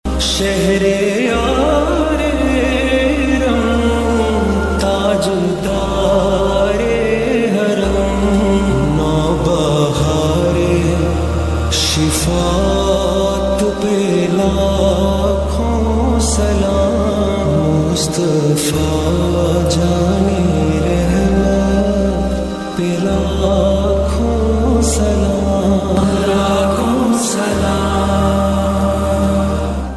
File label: slowed islamic ringtone